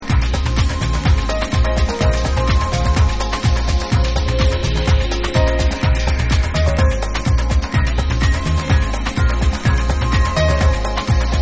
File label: Piano Dub